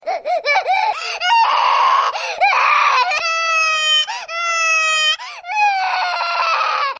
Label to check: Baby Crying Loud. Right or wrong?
Right